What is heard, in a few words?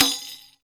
Rek Khana Open; Roland BK-5; Percussion